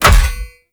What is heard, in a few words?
Foley Action Default Body Hit Metal Heavy; Characters; Player